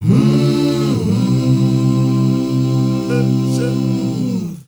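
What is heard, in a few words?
HUMMER, MMM CHORDS; Spectrasonics; Heart of Africa 2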